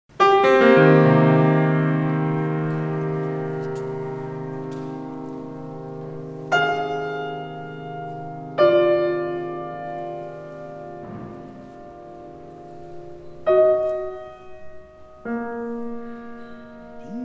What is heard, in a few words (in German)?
Trostglocken Lied